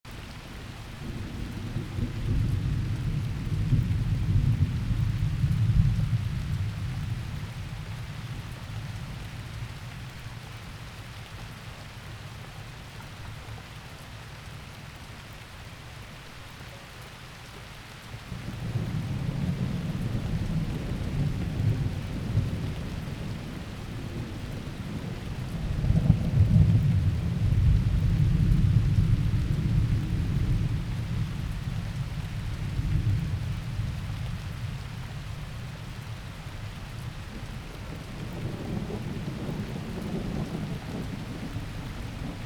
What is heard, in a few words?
thunderstorm